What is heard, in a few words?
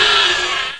Small Alien Die; Army Men TiS